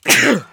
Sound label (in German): Niesen